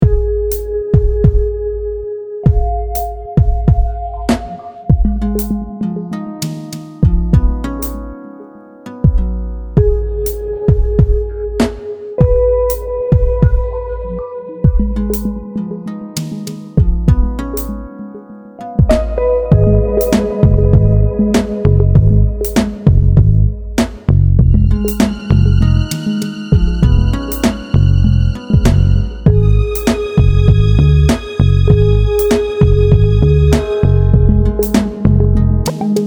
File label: Instrumental